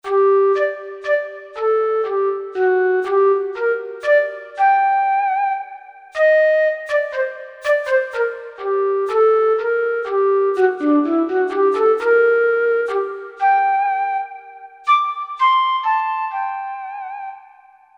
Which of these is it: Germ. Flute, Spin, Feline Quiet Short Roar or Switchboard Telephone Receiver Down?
Germ. Flute